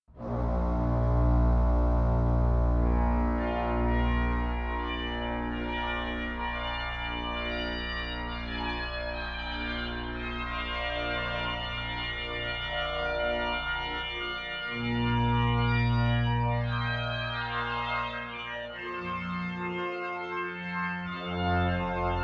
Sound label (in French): Grand dialogue; Louis Marchand